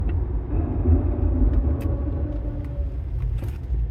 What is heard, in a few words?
brake noise after dealer service